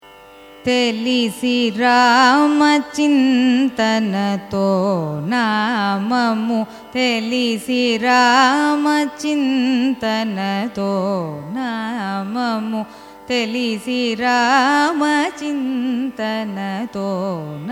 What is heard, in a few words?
raga poornachandrika carnatic; carnatic music